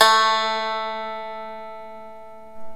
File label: c2-d#4, Small Koto; Ethnic